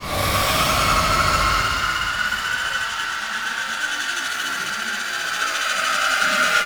Mind Drill; Warframe; The War Within; Mirror; Cinematics

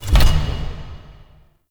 UI Mod Deactivate Turret Weapon; Warframe; Railjack; Player Ship

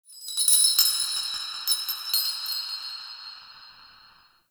Metal Chimes; Warframe; Ambience; Nokko Colony

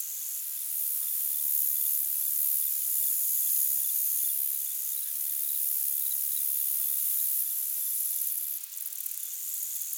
Insects High; Ambience; Props; Warframe; Eidolon Plains

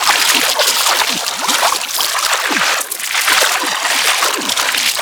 Ink Rifle Reverse Beam Loop; Warframe; Tenno

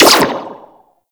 Sentinel Laser Rifle Fire; Warframe

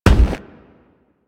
Crewman Footstep Walk; Warframe; Corpus

